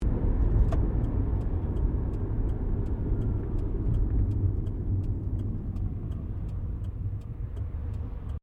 CAR SUBARU LIBERTY INTERIOR DECCELERATING INDICATORS